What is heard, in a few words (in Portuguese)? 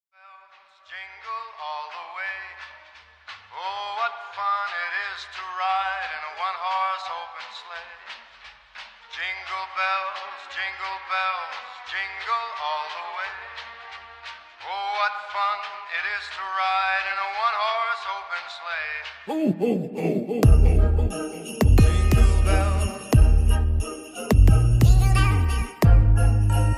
jingle bells rap especial de natal